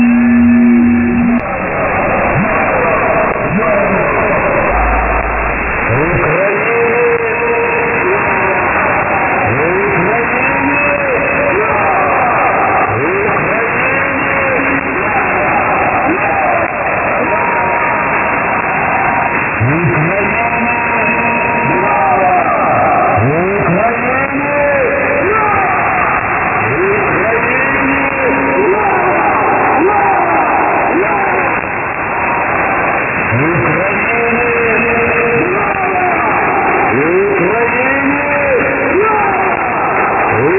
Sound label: Chanting Loop, numbers stations; 5130